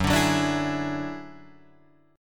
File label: Gb 7th Suspended 2nd Sharp 5th, Guitar, Drop D